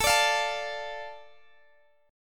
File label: A Suspended 4th Sharp 5th, Guitar Drop D